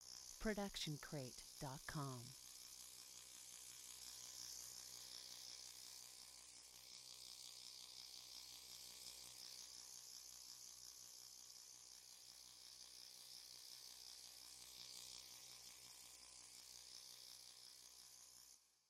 hovering drone; soundscrate

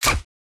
silencer

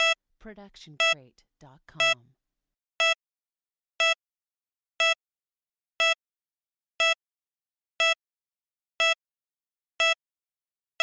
Bomb Timer Beeps

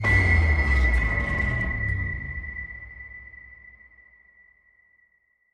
cinematic hit trashy pin drop; soundscrate

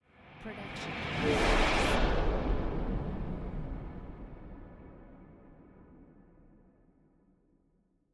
Noise Lifter; soundscrate

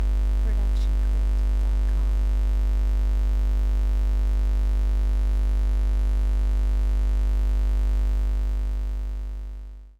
TV CRT Ground Noise 50Hz EU Loop; SoundsCrate

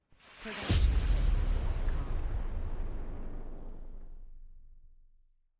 Cinematic Hit

